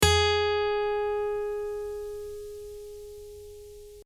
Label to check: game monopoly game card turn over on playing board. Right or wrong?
Wrong